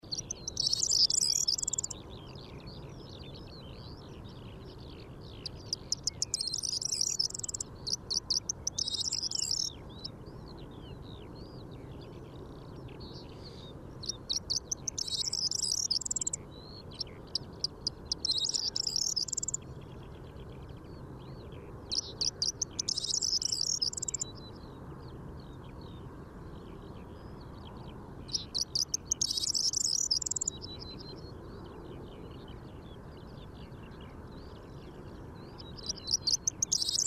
Grauammer; Garchinger Heide; Susanne Rieck

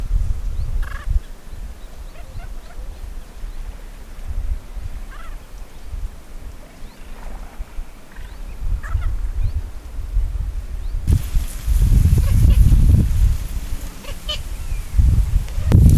Zwergdommel; Mohrweihergebiet ERH; Barbara Goldmann

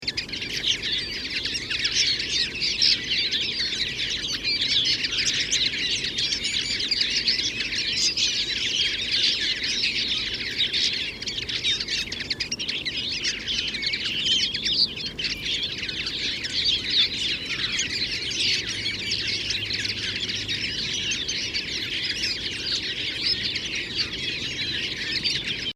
Bluthaenfling; Klosterholz LA; Michle Peron

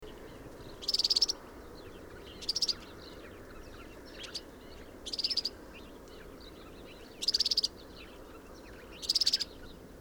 Girlitz; Binsham LL; Susanne Rieck

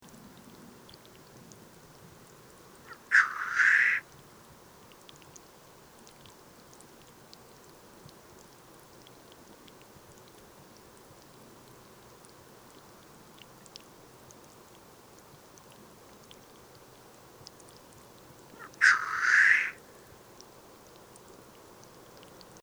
Birkhuhn; Wank GAR; Michle Pron

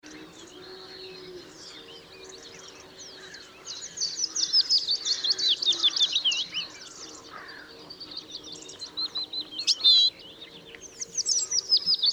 Blaukehlchen, Schurrsee; Michle Pron

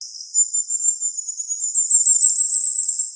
Sommergoldhaehnchen; Maedelhofen WUe

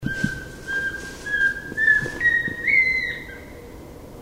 Sperlingskauz; Ruselgebiet; Susanne Rieck

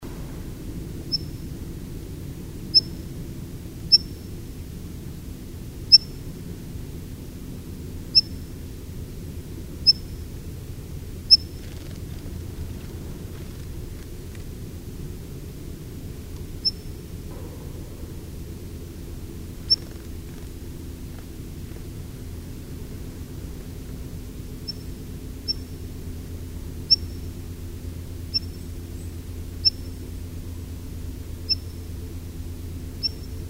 Hausrotschwanz; Ruselgebiet; Susanne Rieck

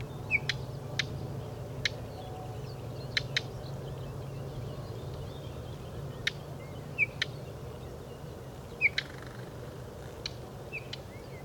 Braunkehlchen; Murnauer Moos; Michle Peron

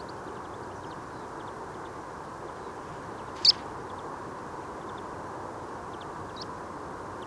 Rotkehlpieper; Garching M; Michle Peron

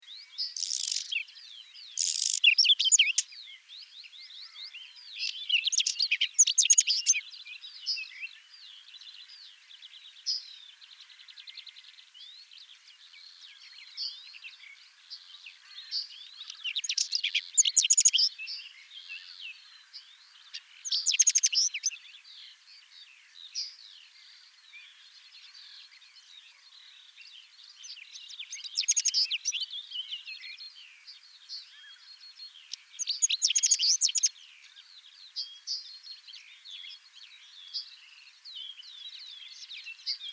Braunkehlchen; Raisting; Michle Pron

